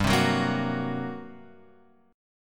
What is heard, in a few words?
G-Minor Major 7th Double Flat 5th-G-down-Guitar-Open F; strummed; JGuitar